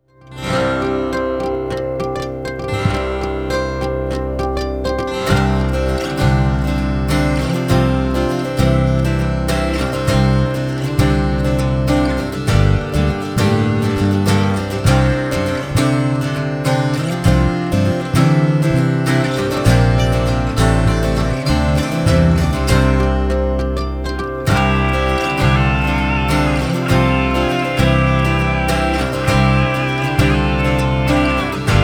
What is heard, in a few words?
jingle